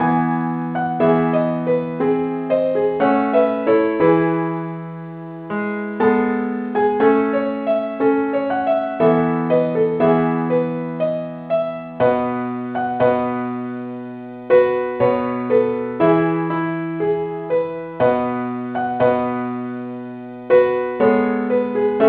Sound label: keyboard Only key A